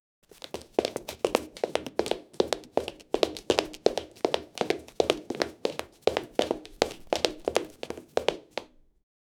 running in high heels, footsteps

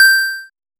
pick up item in video

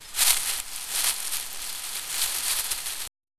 subtle rustling of dry; foilage

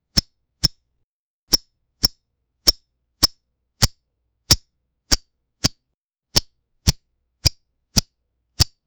generate sounds of a knife

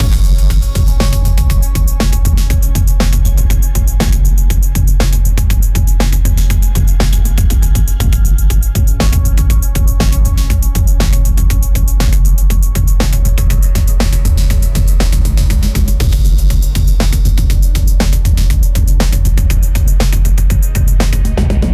junglednb track, drum and bass

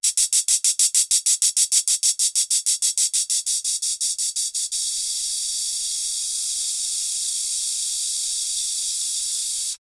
cobra hiss; snake

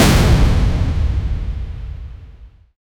a powerful ground impact; physical

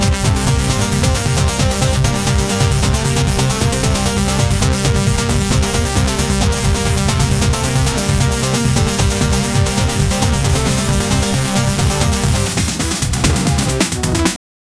game boss fight music

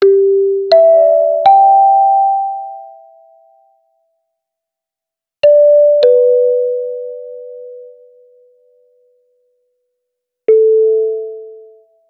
a chime for a lawyer, notification

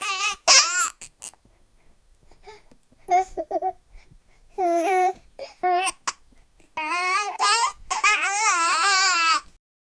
baby talk gibberish and

